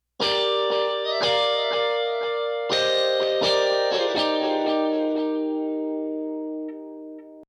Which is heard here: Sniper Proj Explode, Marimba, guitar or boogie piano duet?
guitar